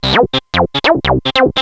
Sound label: ring